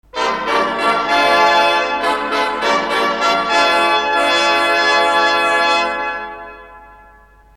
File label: aviamarch call-sign